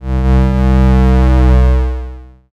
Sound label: creak